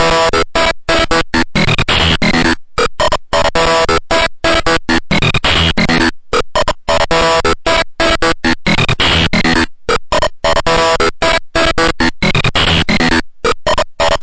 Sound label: 135 squelchy, synth and electronic loops